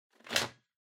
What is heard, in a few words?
Vacuum Cleaner Battery Remove; 掃除機; バッテリー 外す